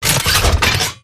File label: gunstand pickup, turret; gow; turret judgement